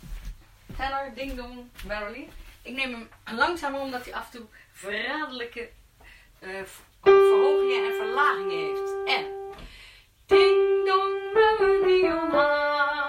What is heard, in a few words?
Tenor ding dong